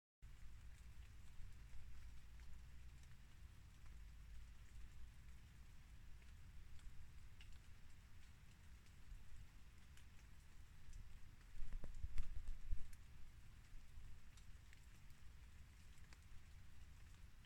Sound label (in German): nasse Büsche Wiese; Modulkoffer